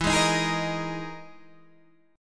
E Major 7th Flat 5th down Guitar Half Step Down

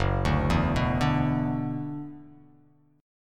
F sharp 7th Suspended 4th Sharp 5th F sharp down

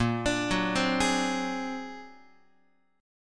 A sharp Augmented 9th A sharp Guitar; A#+9 arpeggiated; JGuitar

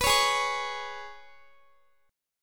Ab Minor Double Flat 5th down Guitar; strummed; Abmbb5

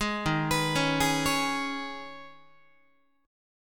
D sharp 7th Suspended 4th Sharp 5th, Guitar; D#7sus4#5 arpeggiated; JGuitar

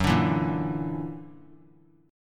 F sharp Augmented 7th F sharp, chord; F#+7 strummed; 18,21,19,20

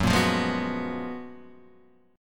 F sharp Major 11th; F#M11 Chord {2 1 3 1 0 2} strummed

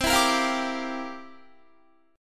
C 11th C down Guitar; strummed

